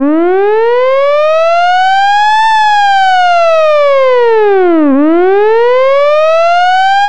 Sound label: fire truck wail siren